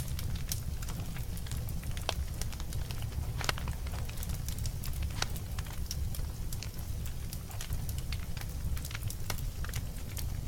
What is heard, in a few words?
Fire Sound; Granny 3; Android